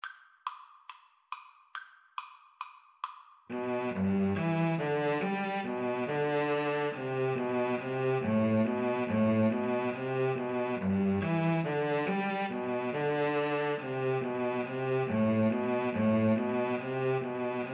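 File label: good king viola duet, violin cello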